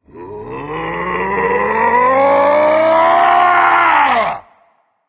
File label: battle cry; cerberus